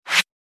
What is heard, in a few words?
Slip swipe flip page feed